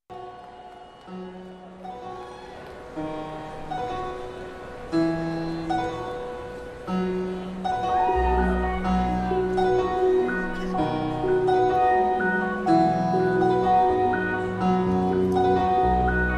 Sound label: Keyboard Army